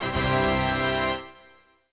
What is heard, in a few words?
tada